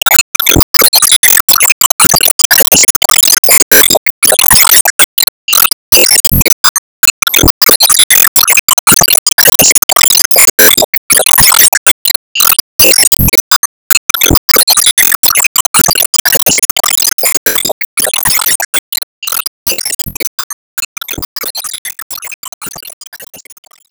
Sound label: Expansionball Zap Loop; Disney Star Smash; Android, iOS, Mobile